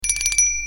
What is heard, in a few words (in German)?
Fahrradklingel; Motion Silver